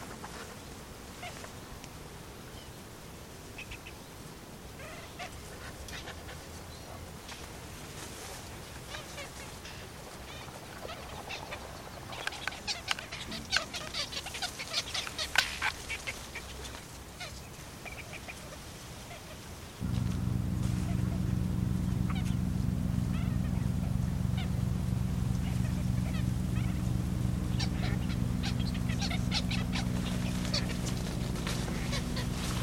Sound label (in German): Zwergdommel; Mohrweihergebiet ERH; Barbara Goldmann